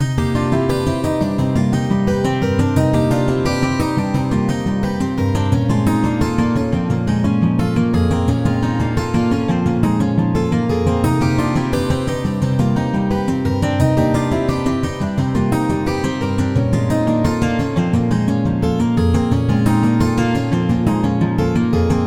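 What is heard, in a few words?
poly guitar both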